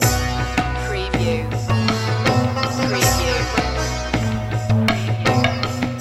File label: indias charm loop